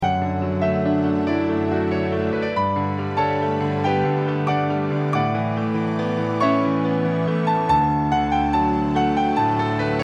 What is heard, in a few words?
classical; Huawei Ascend G510; Geocell